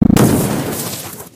weapon disintegrate; Garry's Mod; hl2; citadel

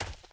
grass, footsteps, run; Left 4 Dead 2

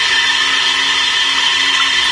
steam loop, gas; Garry's Mod; hl2

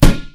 rubber tire impact bullet; physics; Garry's Mod; hl2

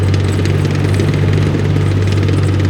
tank engine medium loop; Hearts of Iron IV Death or Dishonor

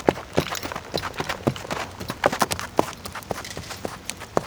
cavalry entity move; Hearts of Iron IV Death or Dishonor

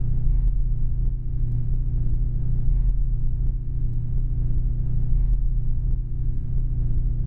hum; AssaultCube

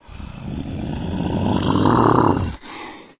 tank breathe; voice; idle; Left 4 Dead 2

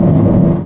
pontoon scrape smooth, airboat; Garry's Mod; hl2; vehicles

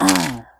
fall hurt; Ace of Spades

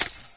stalker footstep left; npc; Garry's Mod; hl2

